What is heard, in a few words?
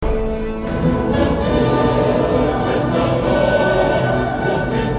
Game Tune